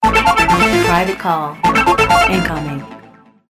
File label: incoming call ringtone